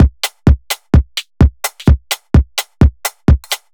Beat, 128bpm, uk garage

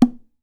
CALF H, AFRICAN PERC; AKAI S6000 CD-ROM - WorldSounds

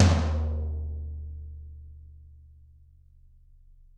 Toms, Drums; Bob Clearmountain Drums I

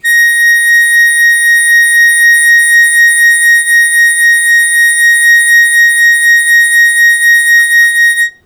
Long Sustain Fast Wah Wah Bb3, Harmonica; Zero G - Harmonica

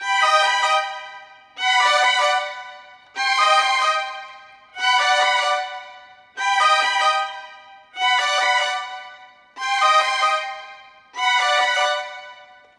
Violins, Cinematic; Sonic Foundry (Sony Creative Software); Orchestral Series VOL-3 - Cinematic

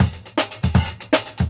160 Vintage, Jungle Breaks; Original Jungle Breaks

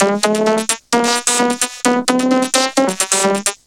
Vocobeat; Sonic Foundry (Sony Creative Software)

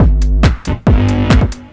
drum 138, Techno, drum-loops

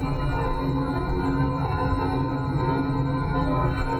Tonal Re Draw, sparse soundscape

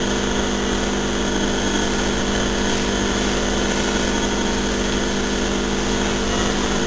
Drone Ambient; Drums & Drones

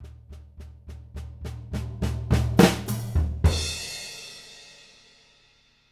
JZ70 SWING, Living Drums

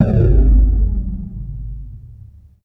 Depth Charge; Total Drum&Bass; 90 s Sample CDs; Hits & Bits

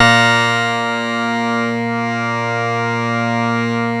A#, PIANO; Big Fish Audio; Synclav FM

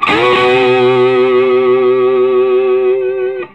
SOLO, Vintage Blues Guitar; USB Soundscan vol.22; AKAI; SOLO B125

